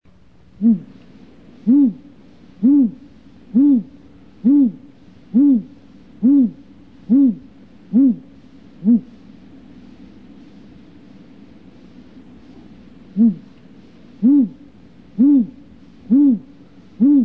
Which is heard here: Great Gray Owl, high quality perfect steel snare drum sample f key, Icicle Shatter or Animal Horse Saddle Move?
Great Gray Owl